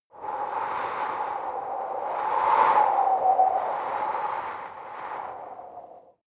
breath female exhale long wind

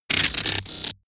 sci-fi electromechanics small stutter

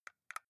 console controller xbox arrow key press slow

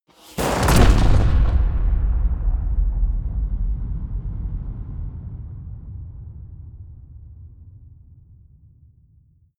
shotgun fire huge reverb bolt

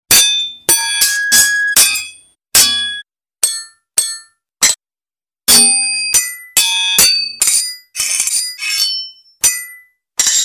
sword hit seq layer

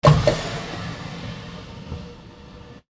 hydraulic door

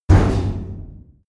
big metal impact short

